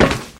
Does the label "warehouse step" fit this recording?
Yes